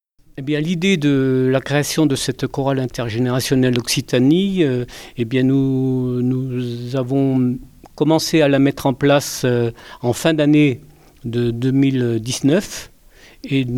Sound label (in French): chorale